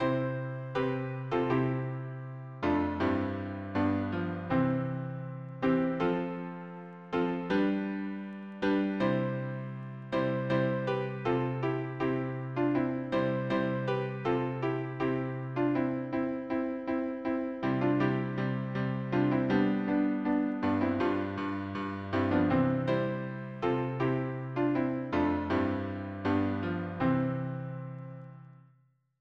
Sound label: harmony piano